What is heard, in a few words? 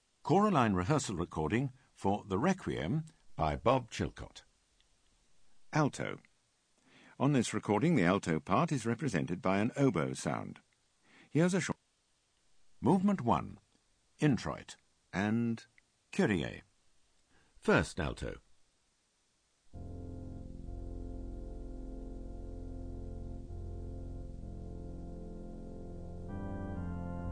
Alto; Chilcott Requiem